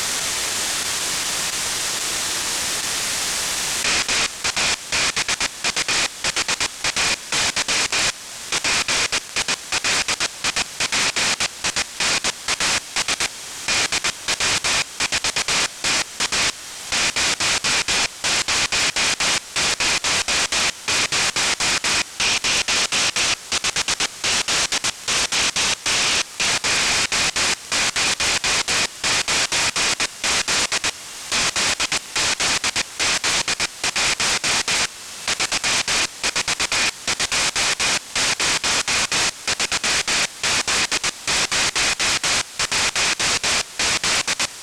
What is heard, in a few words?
diwata2 beacon